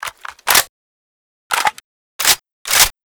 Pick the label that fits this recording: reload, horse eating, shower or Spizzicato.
reload